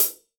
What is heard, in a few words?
ROCK KIT, Real Drum Kit